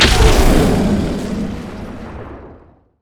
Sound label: roar; majestroar; Volibear